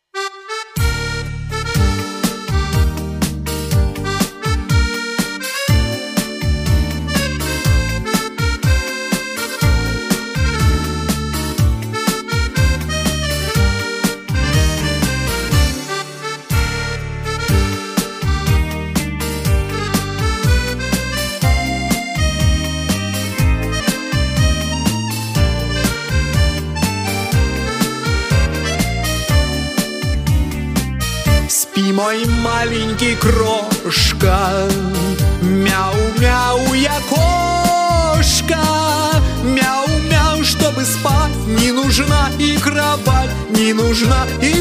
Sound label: cat tango; theatre; mouse; premix